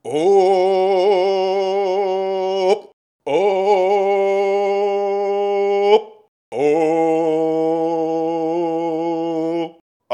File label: Alchemist sing; Hollow Knight: Silksong